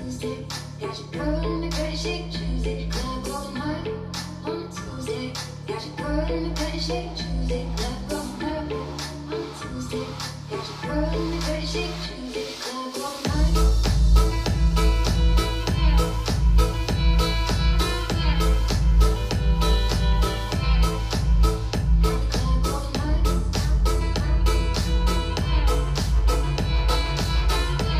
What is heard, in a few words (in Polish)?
elektroniczna poglos